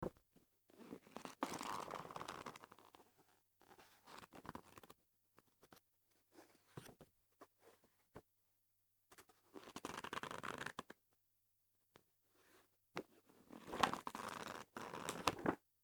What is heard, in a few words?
book; 厚手の雑誌を一気にめくる音